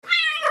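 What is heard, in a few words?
cat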